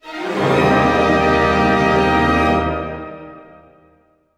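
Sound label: glissando D# major, orchestra; Sound On Sound - Peter Siedleczek's Orchestra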